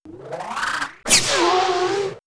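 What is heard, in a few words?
Laser Gun, electronic fx; X-Static Goldmine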